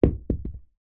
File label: leisure ball small hard drop on internal carpet floor